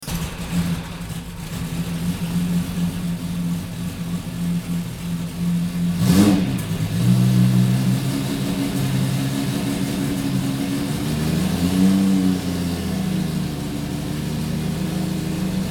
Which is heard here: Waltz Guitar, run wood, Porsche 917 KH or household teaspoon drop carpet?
Porsche 917 KH